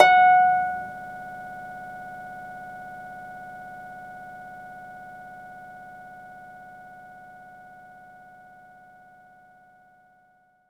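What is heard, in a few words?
Orchestral Harp F sharp 5; Knights Try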